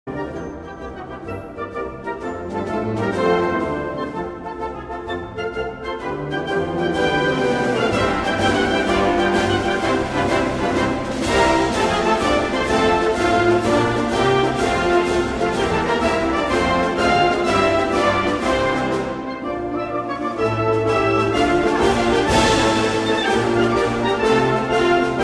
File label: The Liberty Bell